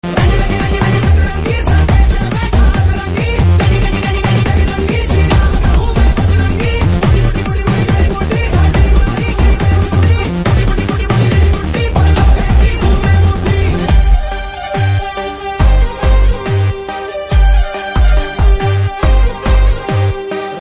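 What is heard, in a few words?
background tone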